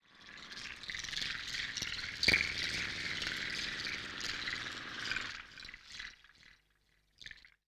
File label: ethno 125